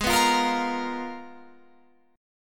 Ab Minor 6th Add 9th, Ab, Guitar, Open F; Abm6add9; strummed; 20,18,17,17,20,17

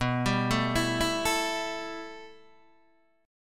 B 7th Suspended 4th Sharp 5th, Guitar, Open D

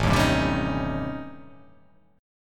A 7th Sharp 9th Flat 5th

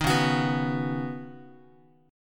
Db 7th Suspended 2nd Suspended 4th, Guitar; JGuitar

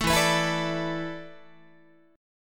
E 7th Suspended 4th, Guitar; E7sus4; strummed; 12,12,14,14,13,13

